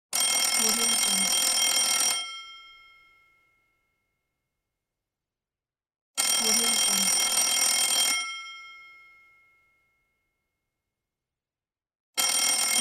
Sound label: telephone bell ringing